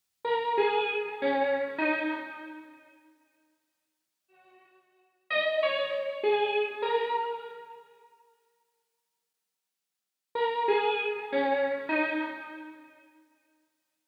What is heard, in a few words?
95 A- Piano String